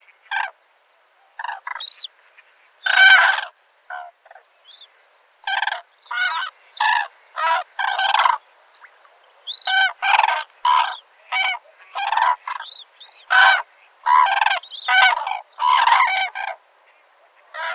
call, Grus grus, Crane, at the beginning of the recording there are some calls of an unidentified pipit (Anthus sp.)